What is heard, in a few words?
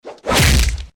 Punch